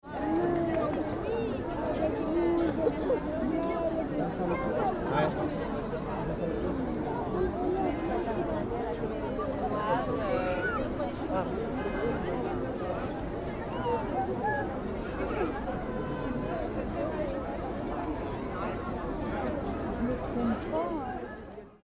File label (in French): public voix ext; COMPAGNONS LUNE ROUGE